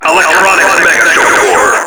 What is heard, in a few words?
Tentacle Swing; Enemies; Abilities; Warframe; Proto Infested Ancient